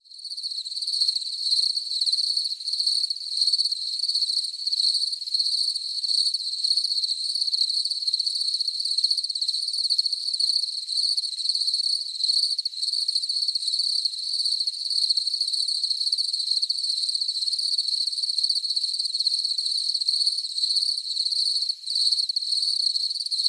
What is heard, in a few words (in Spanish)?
ambiente de grillos